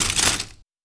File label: Glass